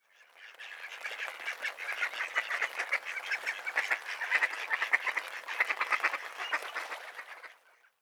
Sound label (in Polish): krzyżówka Anas platyrhynchos